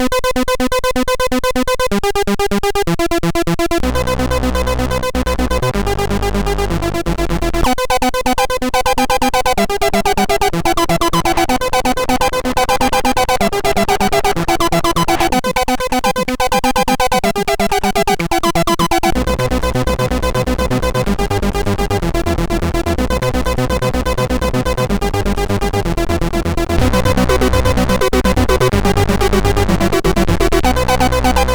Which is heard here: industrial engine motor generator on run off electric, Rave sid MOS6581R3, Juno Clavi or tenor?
Rave sid MOS6581R3